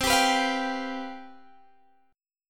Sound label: C Major 7th Suspended 4th, down, Guitar, Drop D